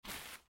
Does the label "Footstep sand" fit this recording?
Yes